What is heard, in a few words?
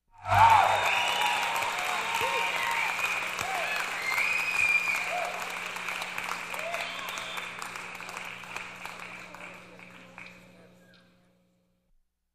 Applause concert crowd with cheers; Technology